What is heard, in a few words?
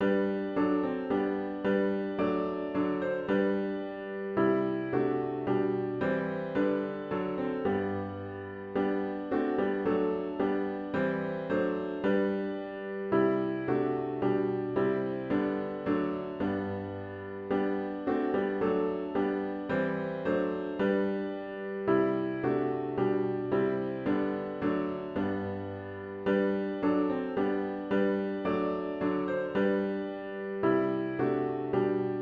God Your Blessing Overflow piano with Intro; worship service